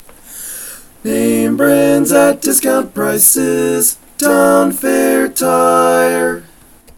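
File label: Baritone, barbershop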